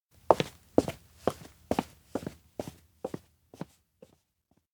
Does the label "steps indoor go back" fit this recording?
Yes